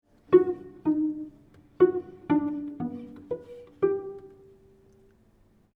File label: melody 3rds